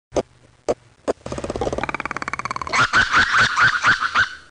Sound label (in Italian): Lemure catta